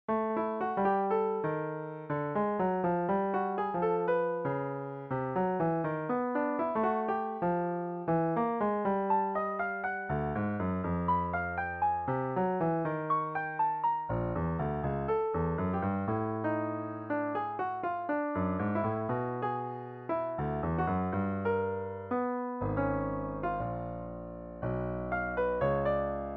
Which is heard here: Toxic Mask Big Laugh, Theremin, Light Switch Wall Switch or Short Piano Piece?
Short Piano Piece